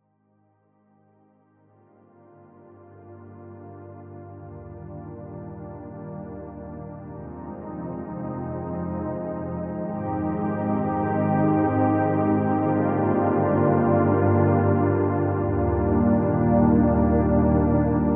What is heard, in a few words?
synth finale intro; SnootGame